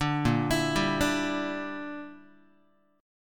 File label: A sharp 6th Flat 5th, Guitar; A#6b5 arpeggiated; JGuitar